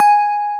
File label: BELL SYN